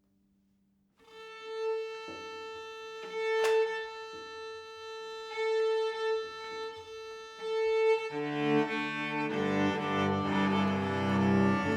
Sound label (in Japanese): チューニング; 東方紅楼夢9